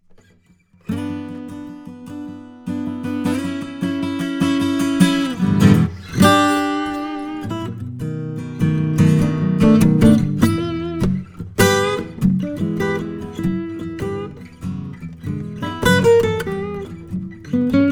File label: Blues